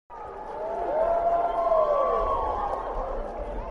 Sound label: applause; edukitty; shapes